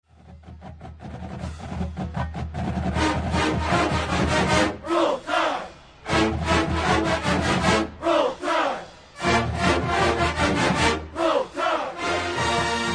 cheer roll tide million dollar band university of alabama crimson tide